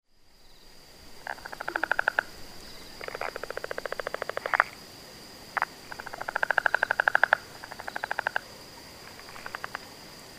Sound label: frog rifle